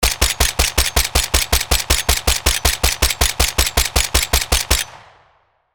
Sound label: Heavy machine gun automatic fire long; guns